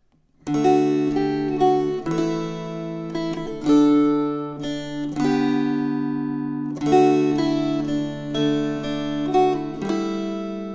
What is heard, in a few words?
String Choir